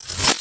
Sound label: barrier end; fenrir